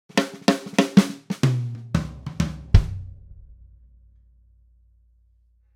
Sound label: Drum Fill Dual99v500